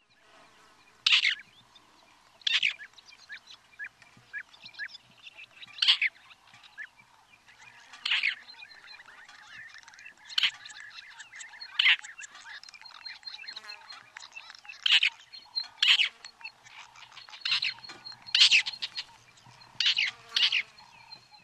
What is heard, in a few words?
Lesser grey shrike